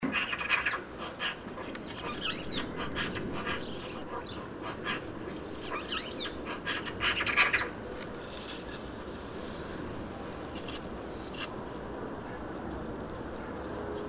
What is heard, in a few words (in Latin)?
Pica pica; SETE